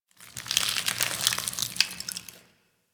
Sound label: Ice Crackle Amb